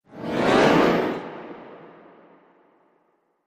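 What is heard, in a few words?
Grand Piano Cacophony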